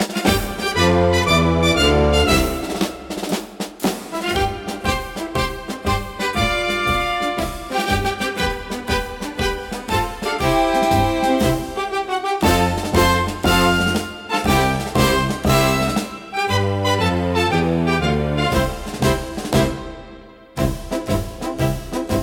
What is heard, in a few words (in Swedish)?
AI paradblasorkester marsch; Seniorradiomarschen